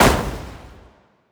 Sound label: Fireworks Medium Explode